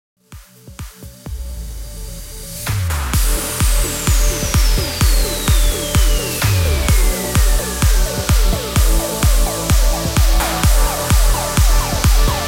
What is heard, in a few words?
128 BPM